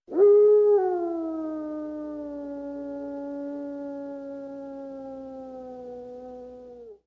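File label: sounds with dog howling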